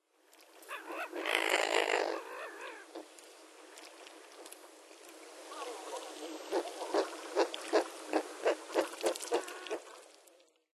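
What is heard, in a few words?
hidorigamo, Eurasian Wigeon; hyogo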